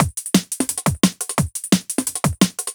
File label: DNB BREAK